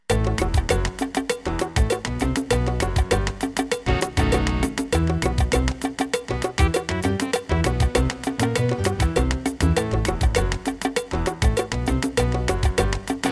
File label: Salsa